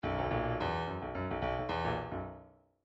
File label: bdf midi file grooves funk t funk t